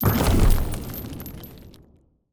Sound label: ram hit buildings; ships siege